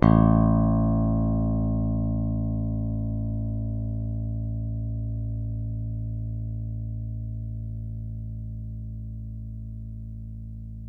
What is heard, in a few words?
A0 pick, bass